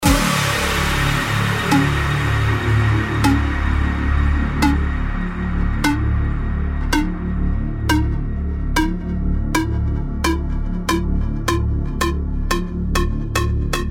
especial deep house; rondonia

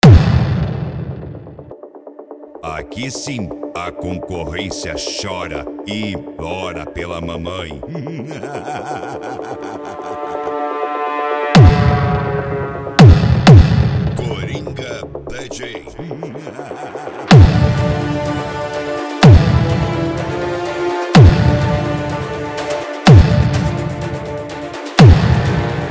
funk

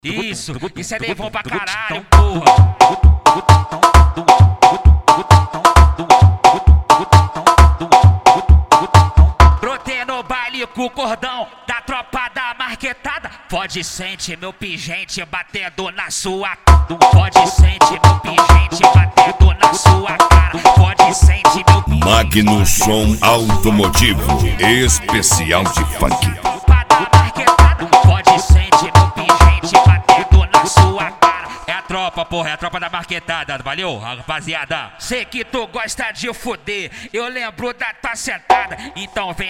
funk

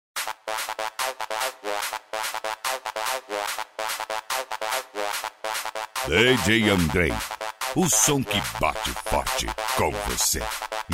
pancadao funk bass

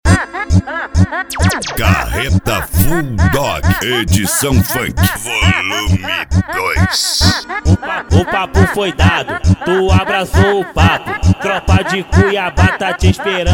funk

